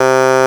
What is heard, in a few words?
svox, Digital Synths; Ableton Foundation FreePacks